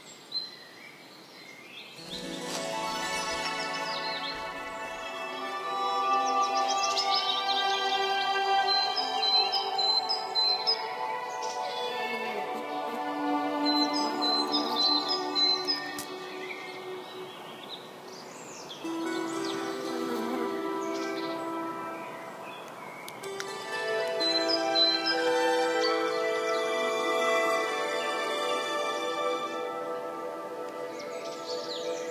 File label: Halloween Ambience